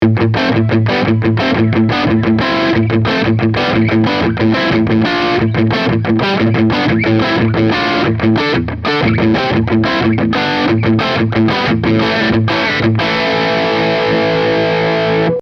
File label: fender squier bullet strat hss rw bridge distorted hard rock